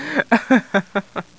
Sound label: laugh; Leonardo The Inventor; Broderbund; Riverdeep